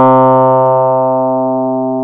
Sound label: Synth C3